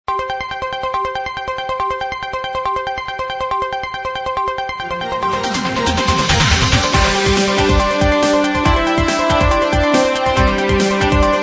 Broadcast News

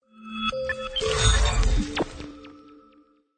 Digital Transition Logo

